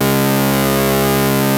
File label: ARP 2600 G#2, synthesizer; Hollywood Edge - Giorgio Moroder Rare Synthesizer Collection